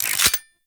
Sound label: Hand Shotgun Reload